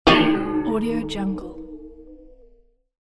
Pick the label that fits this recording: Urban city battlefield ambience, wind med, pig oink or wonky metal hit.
wonky metal hit